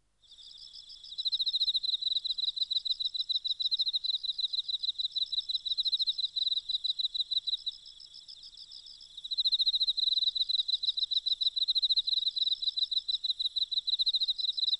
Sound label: Insect Chorus; NHK